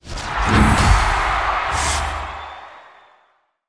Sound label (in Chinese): 战斗开始VS音效; nba; 战中